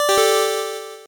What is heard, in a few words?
8bit se